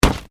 WRECK WINDSCREEN IMPACT, Wrecked Cars; impacts, crashes, scrapes; Objects

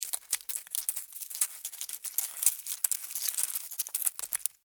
Insect Legs Walk Dirt; Insect Movement; Animals:Insects

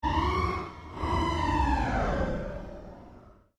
Created Cyber Whale Call, Alien Sound Effects; Created creatures, vocalisations and pretend speech

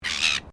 Bird Silver Gull Squark, Australian birds; Birds (Sound Effects:Animals)

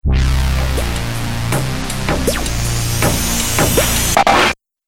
Radio Stinger

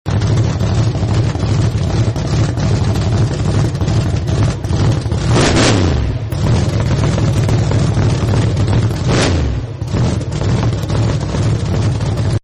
Hot Rod Idle Rev Loop, Cars; Vehicles

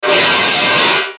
static flange; Static Flange Sweep

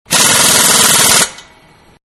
Air Wrench Long; Power Tools (Sound Effects:Tools); Manhattan Production Music

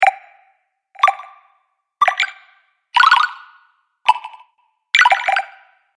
Fantasy Game Collect Sound Pack, video game

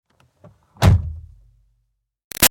Car Highlander Door Interior Close; 2005 Toyota Highlander V6; Cars; Vehicles

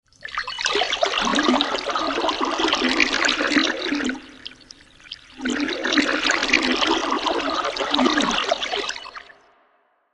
Warped Water, Warping sound of water flowing; Warped (Sound Effects:Sound Design)

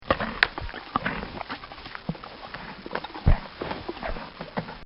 A Horse Chewing A Carrot; Horses (Sound Effects:Animals)

